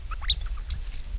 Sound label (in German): quietscheln, octodons